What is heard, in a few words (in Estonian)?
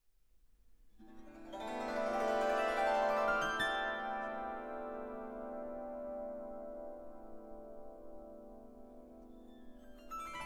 glissandod, Kannel; Glissandod mängituna sõrmeküünega kõlavad tervamalt ja läbitungivamalt, sõrmepadjaga - pehmemalt.; tooniliselt, tõmmates sõrmedega üle keelte; Resonabilis